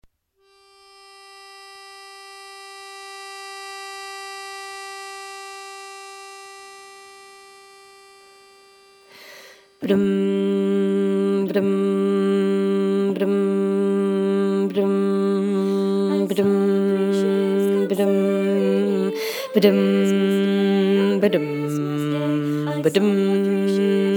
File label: bass predominant; I saw three ships